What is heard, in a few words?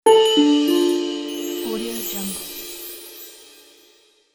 magic light spell